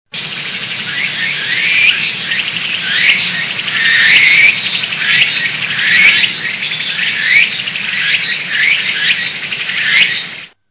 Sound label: jungle